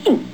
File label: hurt; raydium